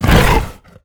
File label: attack; dota; jakiro